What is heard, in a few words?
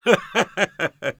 laugh evil, es; Engineer; Risa malvada 1